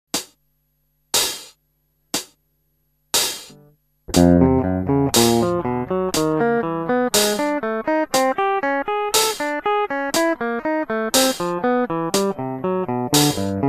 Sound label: finger easy